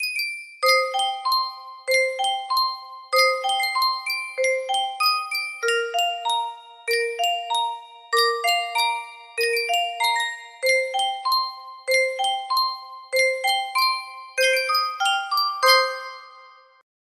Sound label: yunsheng music box